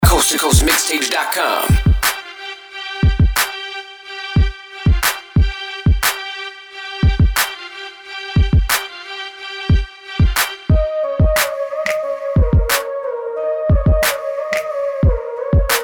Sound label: rock instrumental